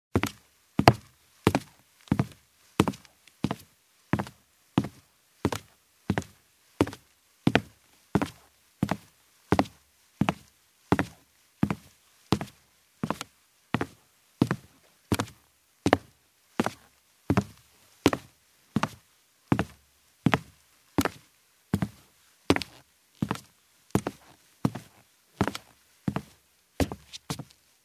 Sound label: Steps on the wood floor in shoes